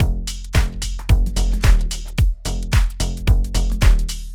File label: Bass With Full Drum Beat In Chorus, pop rap